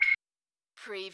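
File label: cool rollover, Button / Menu Sound; Button / Multimedia Sounds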